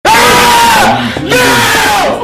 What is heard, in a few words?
scream ahhhhhhhaaaa